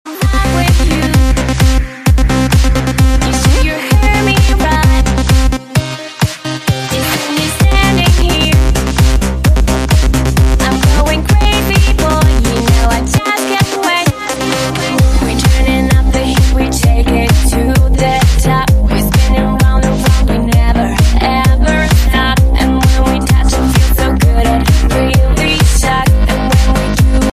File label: House Rock And Pop